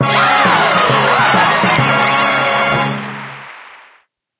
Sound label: gear enhanced